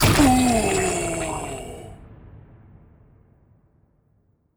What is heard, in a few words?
zombie guard death